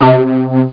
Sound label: Woodwind; HyperComposer™ DEMO